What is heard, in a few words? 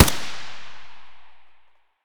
ak74u muzzle mono distant, weapons; STALKER; IWP